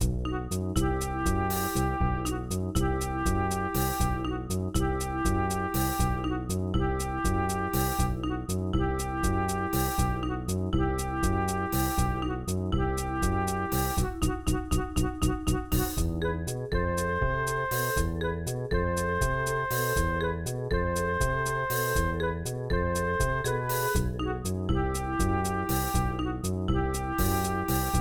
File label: Rumba, Arachno; Blake Stone Aliens of Gold; MS-DOS